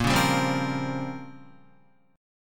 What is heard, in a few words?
Bb Minor 6th Add 9th Bb down Guitar